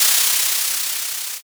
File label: tire skid